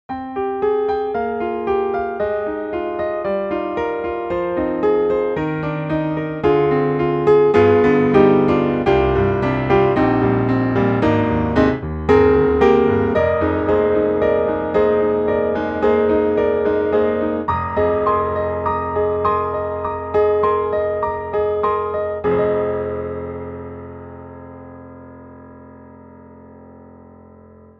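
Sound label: CFX CONCERT GRAND, Contemporary, piano; GARRITAN; Chickenwire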